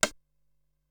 Side Stick Snare, Ensoniq SQ-R Plus

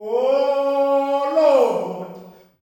Ohh Lord, male; Spectrasonics; Heart of Africa 2; S.MALEAMB

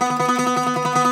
Greek Bazouki, Plucked Strings

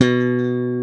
slap bass; E-MU Formula 4000 Series Vol. 3; Analog Odyssey; VK+ Instruments

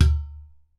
UDU TONE, African Perc; E-MU Formula 4000 Series Vol. 4; Earth Tones

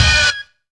STEAMER STAB; Zero-G; Funky Elements

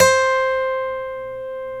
STL NV C 5C, FOLK STEEL Guitars; Spectrasonics; Hans Zimmer Guitars VOL-1

